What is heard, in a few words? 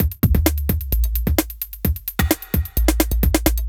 fast break 130